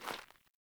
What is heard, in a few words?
Grunt Boot Walk Gravel; Characters; Enemy; Melee Grunt; Footsteps; Boots; Sinecure